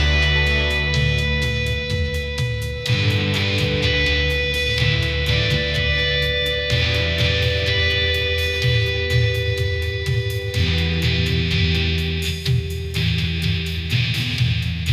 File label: ringtone rock